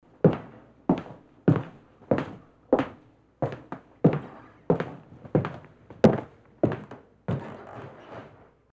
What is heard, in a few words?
Classic Footsteps